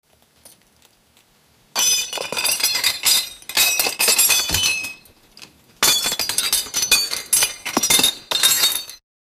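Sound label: medieval knights, armor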